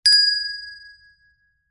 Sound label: bells bell small hand ring ping double fast; zapsplat